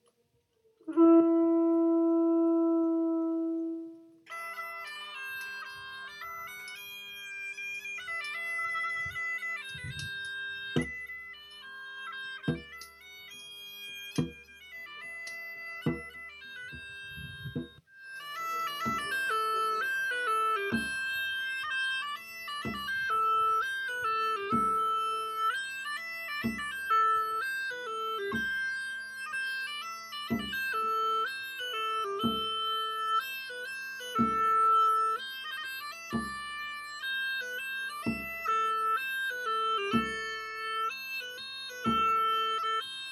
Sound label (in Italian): Registrazione musica